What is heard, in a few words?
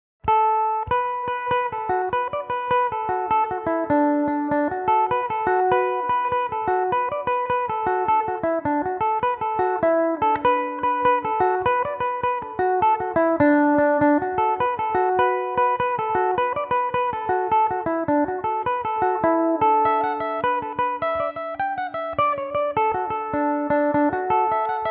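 E dorian, Irish mandolin; father quinns favourite; belmuse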